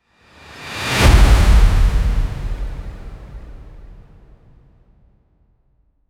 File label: a long modern transition